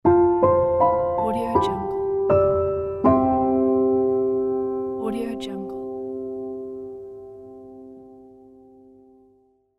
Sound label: soft intimate piano transition